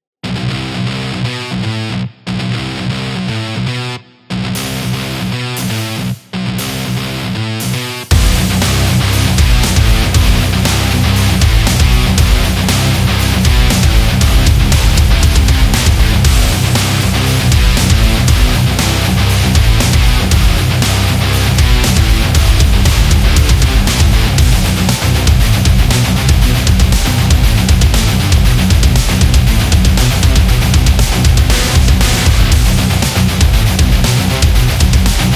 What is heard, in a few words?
Metal